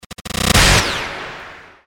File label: ddddd up to stab